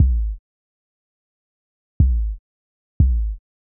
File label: Kick Hard; hydrogen